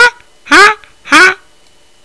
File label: flamingo; It is a big beautiful bird. They are very noisy and go SQUAK...SQUAK...!; zoo